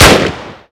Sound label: tokarev shoot, weapons; sven; ww2projekt